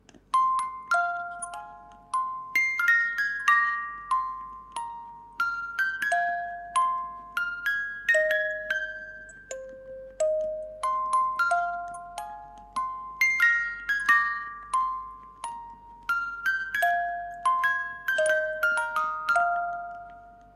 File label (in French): boite a musique; dragon ball; boîte à musique personnalisée